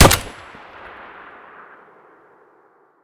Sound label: rsass fire close; Escape from Tarkov